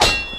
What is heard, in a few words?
run out groove